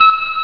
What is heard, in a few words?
beep; Grand Touring